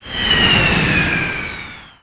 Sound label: carryall land; Emperor - Battle for Dune